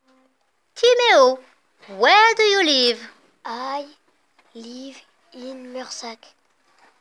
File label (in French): vocal